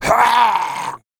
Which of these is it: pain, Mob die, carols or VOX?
pain